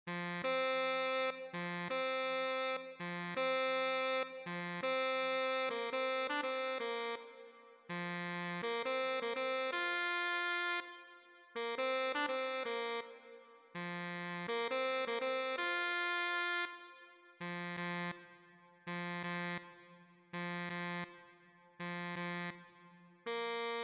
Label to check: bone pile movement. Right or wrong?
Wrong